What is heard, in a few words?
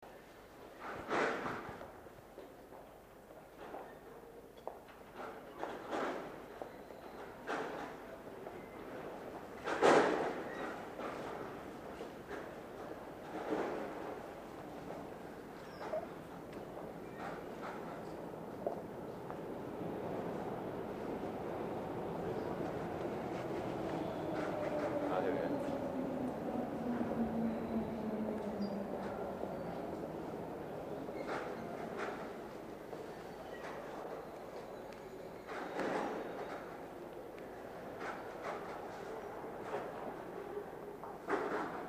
london underground